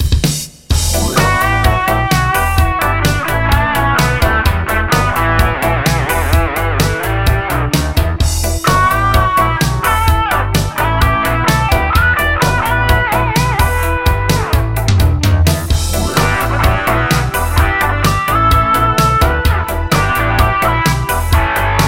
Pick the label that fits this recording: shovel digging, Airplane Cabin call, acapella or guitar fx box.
guitar fx box